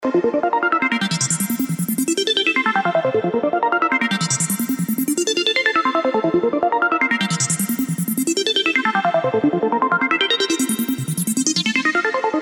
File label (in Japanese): アルペ設定後